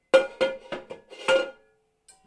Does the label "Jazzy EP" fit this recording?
No